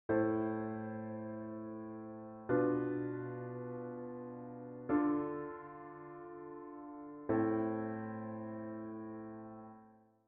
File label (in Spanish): cadencia